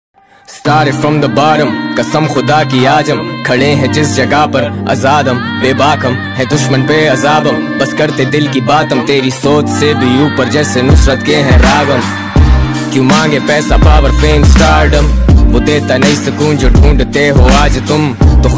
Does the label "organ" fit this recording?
No